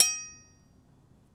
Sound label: Big Knife Tap, Kitchen Knives; Micro Pack